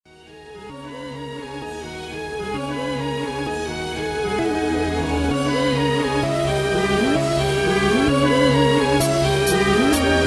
beat

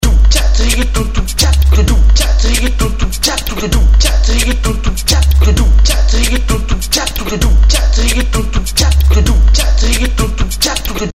beat

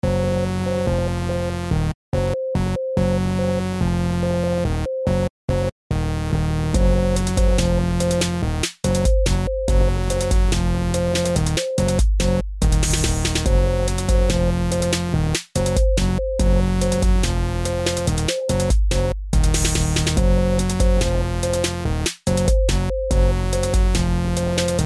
instrumental

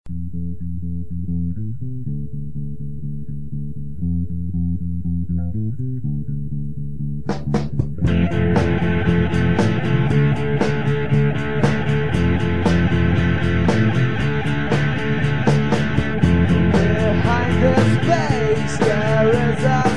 pop song

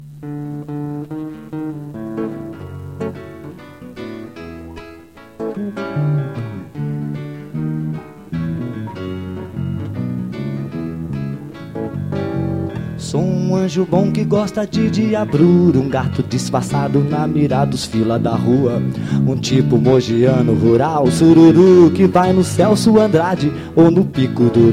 blues

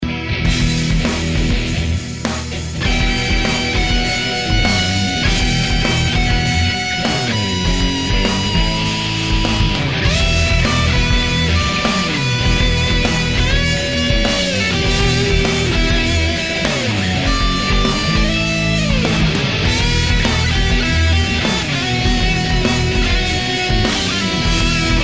guitar hard solo

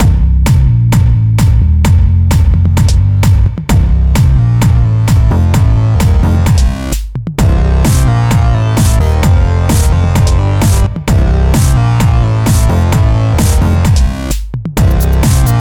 eletrochord; biker

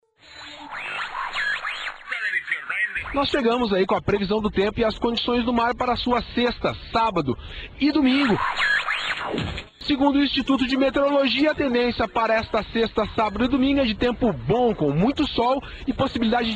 hip hop interludium; SDI Radio; Floripa

